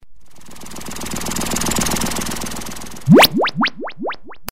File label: Sms Mix blip